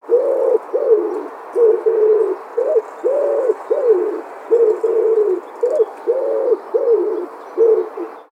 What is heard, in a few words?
Pigeon cooing sound effect